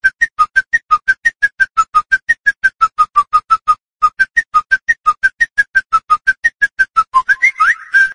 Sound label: Samsung Galaxy S7 Sms Ring